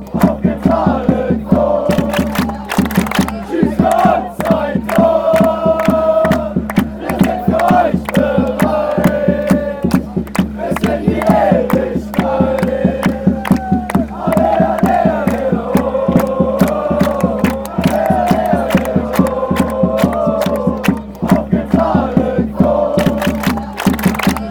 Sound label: allez, fan chants